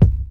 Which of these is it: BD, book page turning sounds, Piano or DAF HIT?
BD